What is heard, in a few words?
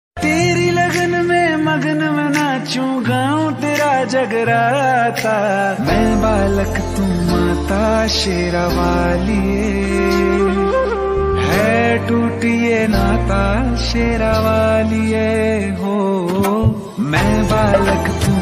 bhakti song ringtone